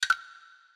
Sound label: perc; eddyflux